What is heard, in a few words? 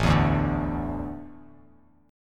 A Suspended 4th, Bass Standard, Asus4 chord; Asus4 strummed; JGuitar